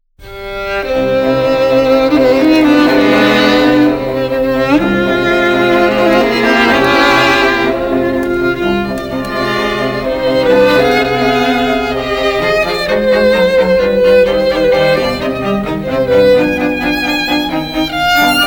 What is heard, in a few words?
strings; beethoven; mastered